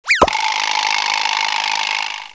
ZIP RAZZ; Kids' CD-ROM Bible Fun Pack; Education Publishing Concepts; NOAHDEMO

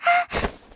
voice tackle; FantasyTennis

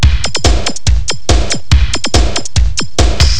techno

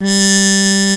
Trumpet; SoundMorph(tm) demo

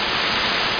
ski; NewSurfs

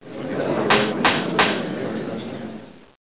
forum

